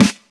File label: Solid Snare One Shot G Key, acoustic snare tuned to the G note; snare drum samples